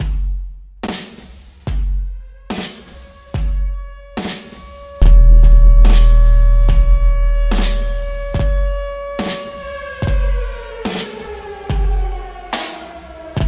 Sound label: 808 Bass Street